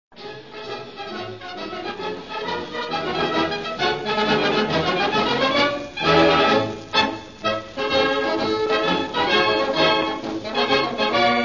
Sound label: brass; Etiopia; military music in Italy; FASCISM; ETHIOPIA